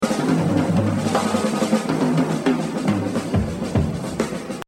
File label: Exercise, jazz drumming; Exercise 25 Buddy Rich - 75%; The Evolution of Jazz Drumming (Gottlieb)